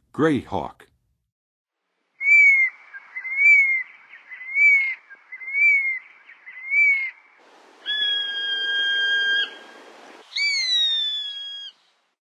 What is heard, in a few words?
Gray Hawk; North America; Western USA; Western USA Songbirds